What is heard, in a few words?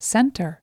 Center, channels; test-card